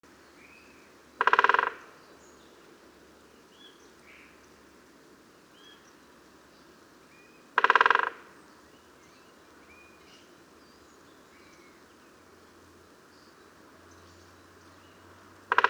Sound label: Great Spotted Woodpecker Dendrocopos major